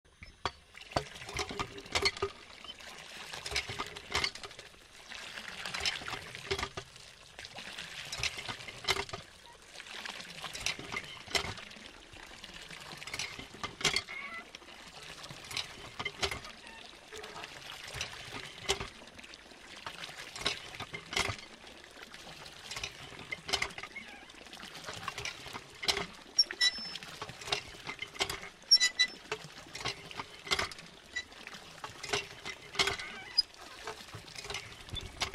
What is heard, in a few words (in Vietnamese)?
tiếng máy bơm nước bằng tay thời xưa